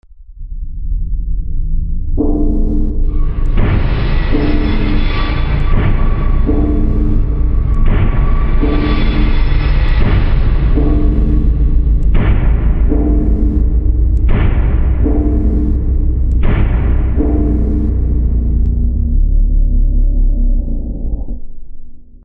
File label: suspense; DayZ-Epoch; dayz sfx